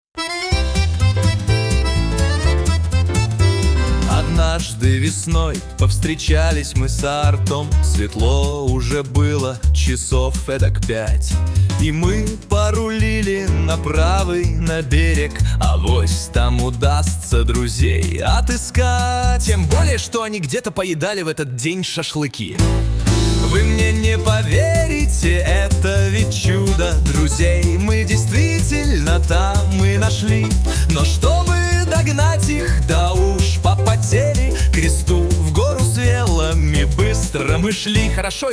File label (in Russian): saksofon klavishnye akkordeon